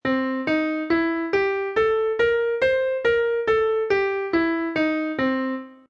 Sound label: Rock Blues